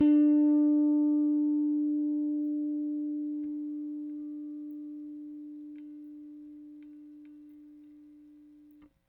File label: RickThumb-A, RickenbackerBass; Airwindows